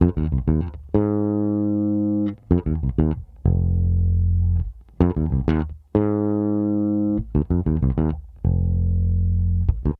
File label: Bass, 70s Analog Funk; Sonic Foundry (Sony Creative Software); What it Is!